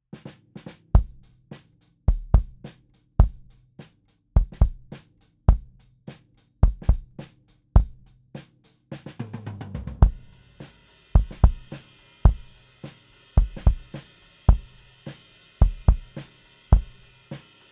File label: Oktava115 Kick Out Full Set